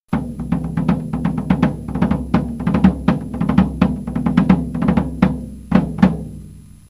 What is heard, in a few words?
tenor drum; percussion; drums